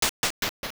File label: Stairs; Walking up or down stairs (entering a building); Oracle